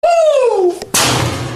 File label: Woooo